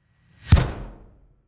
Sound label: incoming kick drum